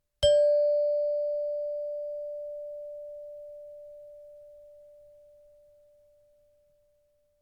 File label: Chimebar D Low